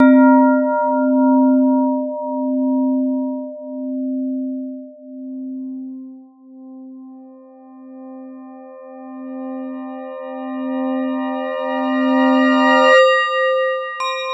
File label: gong